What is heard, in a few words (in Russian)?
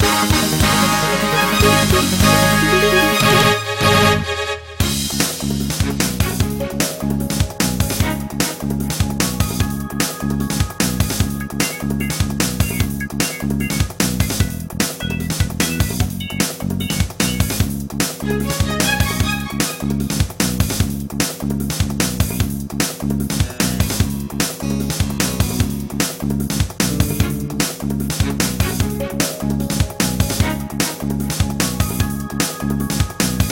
Рингтон Новости